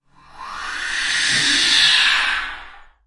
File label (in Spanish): mordida vampiro